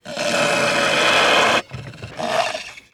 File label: wolf